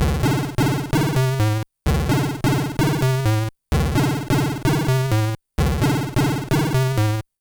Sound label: Wonkin' Bits Synth 129 BpM, Nintendo Gameboy-LSDJ; maurice.rebell Loops